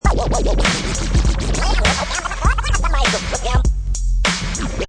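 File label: Scratch Crazy 100 beat, Professional killer vinyl scratch on top of hip hop beat; Dj Sound Effects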